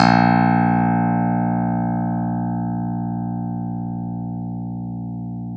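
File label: clavinet; Clav DA; Roland LCDP10 Keys of the 60s and 70s 2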